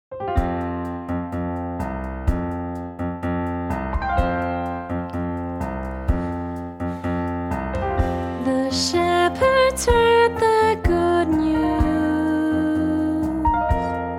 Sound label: Celebration Bells